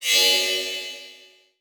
Multimedia Internet CD-Rom Flash Long

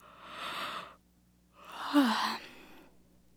Human Female Yawn

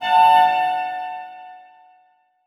Transition Bell Hit; Adobe; Production Element

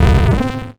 Multimedia Internet CD-Rom Flash Buttons Hard

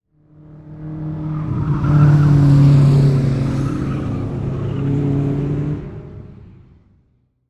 Race Car Drive By 180D; transportation

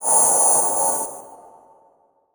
Transition Electrical Surge; Adobe; Production Element

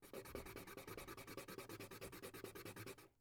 Household Pencil Eraser Erasing On Paper Long

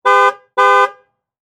Truck GM Envoy Honk Horn Multiple Short; transportation

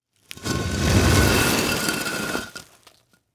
Impact Brick Drag Through Dirt And Rock Long; Impacts

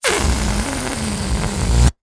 Cartoon Balloon Releasing Air

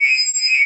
Science Fiction Sci-Fi Electronic Ringtone Swell

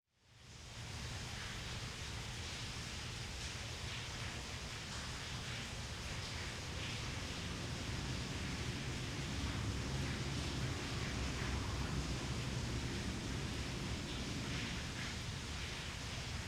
Ambience Traffic Under Bridge With Water; AdobeCC

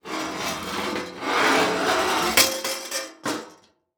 Impact Metal Scrape With Drop; Impacts

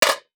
Impact Pan Tin Hit By Small Metal Pipe

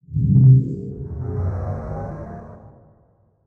Title Transition Swell; Production Element

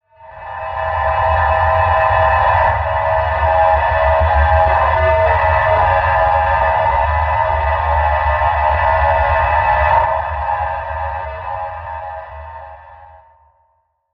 Title Transition Stab Dark Long; Production Element

